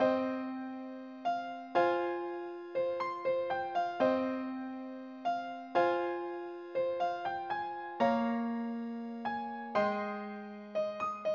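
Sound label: electric piano piece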